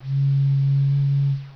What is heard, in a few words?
bottle blow